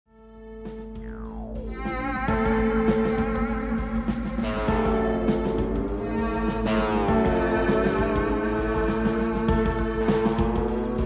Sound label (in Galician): GUITARRA NOVA